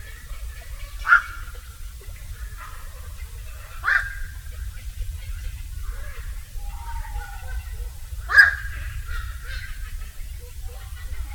kvakva golos

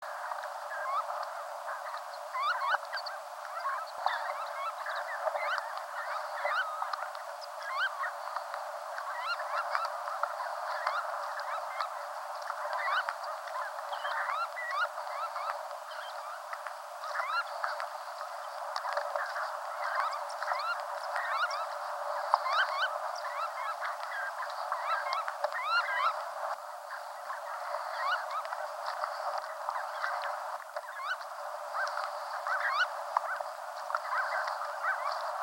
islandskiy pesochnik golos

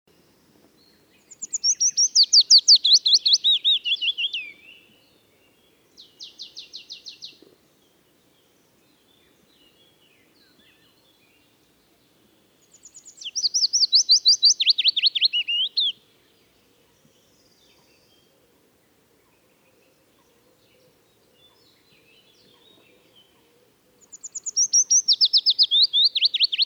penochka vesnichka golos

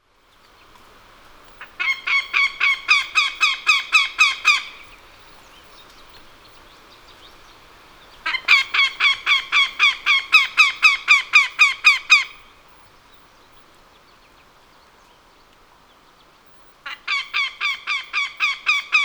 teterevyatnik golos

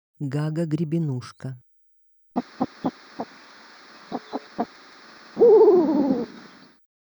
gaga grebenushka golos

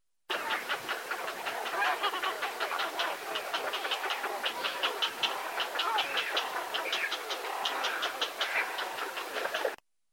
seraya caplya golos

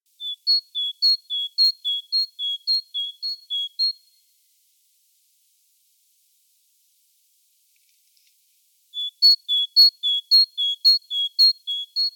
bolshaya sinica golos

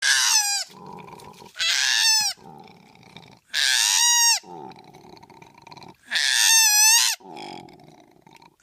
koala golos

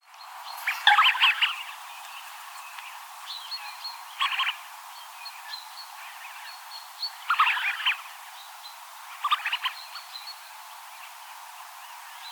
hohlataya chernet golos

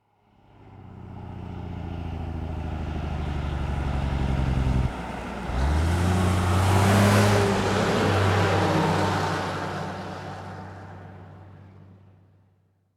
zvuki kamaza

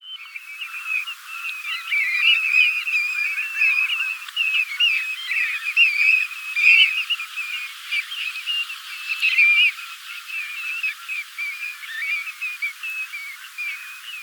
zolotistaya rzhanka golos

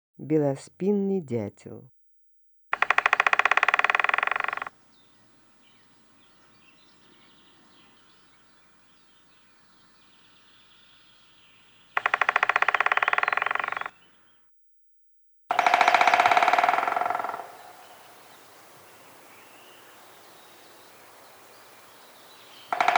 belospinnyy dyatel golos

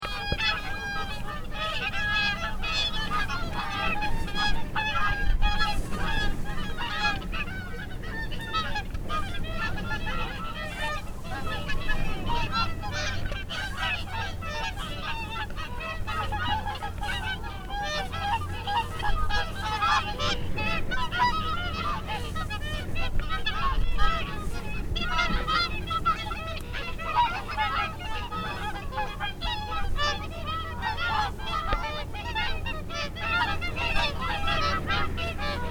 lebed klikun golos

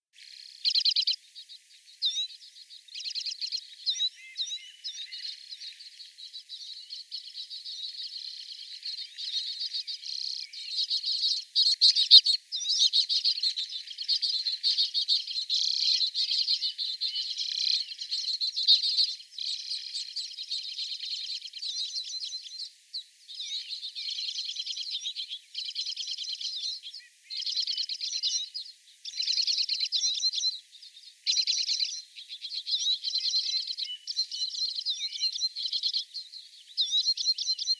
obyknovennaya chechetka golos

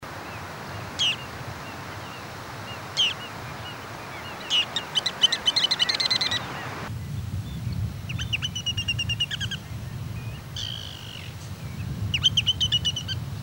kamnesharka golos

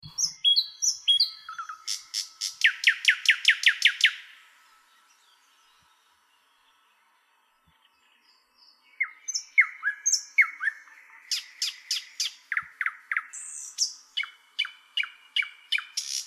obyknovennyy solovey golos

